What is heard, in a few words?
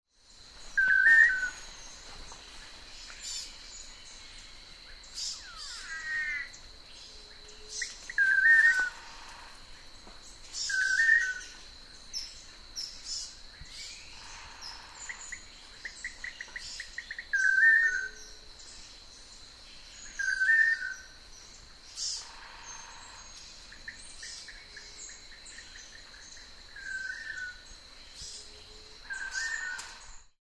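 Manakin, bird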